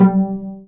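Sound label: cello pizzicato D; AKAI S-Series CD-ROM Sound Library VOL-2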